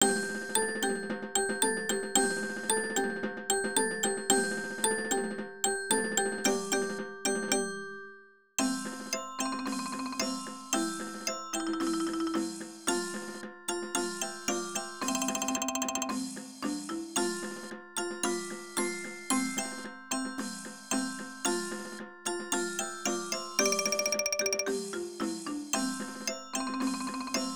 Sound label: Percussion Sound